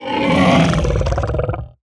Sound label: hoglin converted, 疣猪兽; 疣猪兽：转化为僵尸疣猪兽; 我的世界